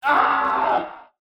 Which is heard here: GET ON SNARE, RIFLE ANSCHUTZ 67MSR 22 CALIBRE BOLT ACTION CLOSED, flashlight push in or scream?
scream